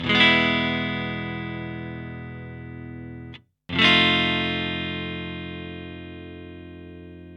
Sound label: GUITAR; widdavirtual